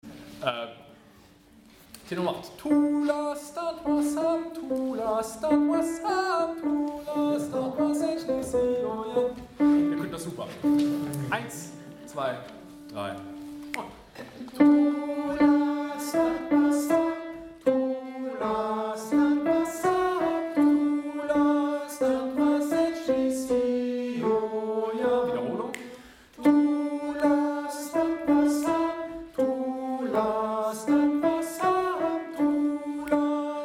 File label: TENOR; Thula Standwa Sam